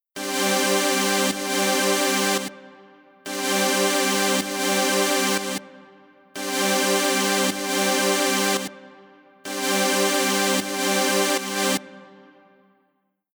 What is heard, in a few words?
FutureBass Mix Chord Chord